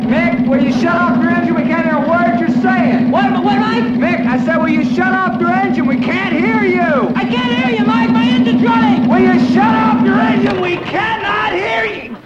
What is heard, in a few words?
engine